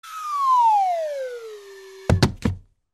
Falling Sound Effects

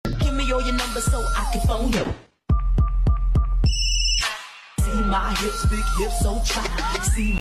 phone ring; tiktok

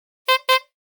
Cartoon Comical beep

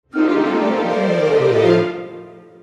Cartoon Orchestra Run

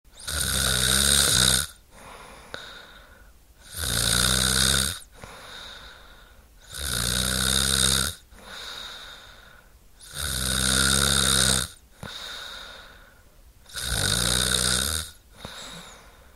Snoring Woman

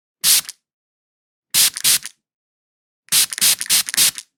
Perfume Spraying and Applying

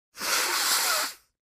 Sniffing

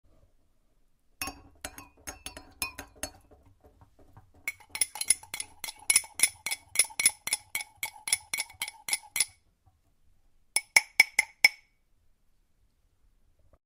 Spoon Stirring cup; صدای استکان، فنجان و نعلبکی

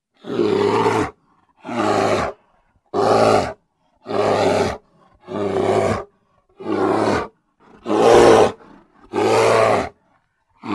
Bear Sound

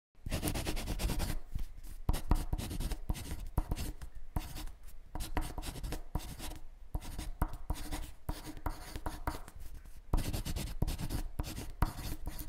pencil writing

